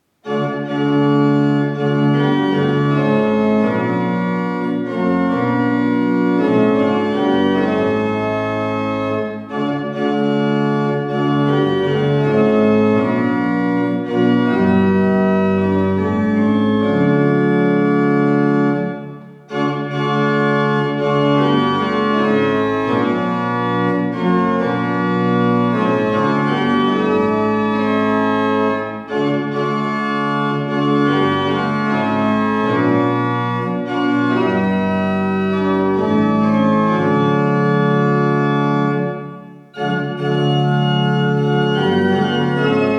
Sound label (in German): Orgel, Laudate omnes gentes